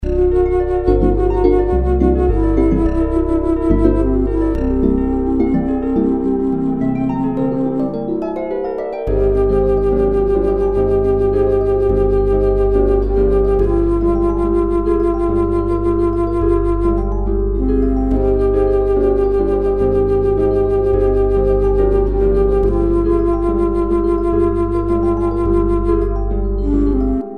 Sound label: Trumpet Song